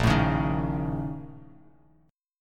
Bb Minor Major 11th Bb, Bass Standard; BbmM11; strummed; 6,12,11,8; JGuitar

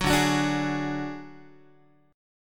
D Major Flat 5th D Guitar, chord; strummed; JGuitar

